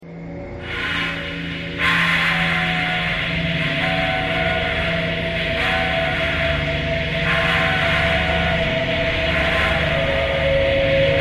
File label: thread pentatonic